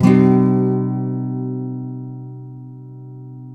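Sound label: nylon guitar down minor C#, flam chords; Spectrasonics; Hans Zimmer Guitars VOL-2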